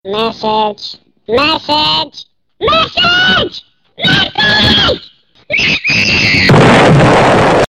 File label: Scream Boom